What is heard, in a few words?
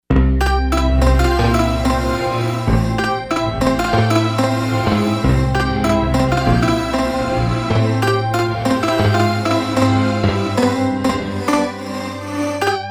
AfterImage, Korg MSC-2S Synth combinations; Synth 1